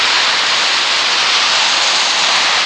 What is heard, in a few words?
WET, SURFACES; ImmersionRacing; CyberMycha 2003 January